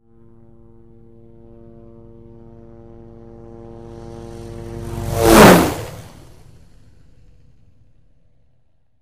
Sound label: Helicopter flies fast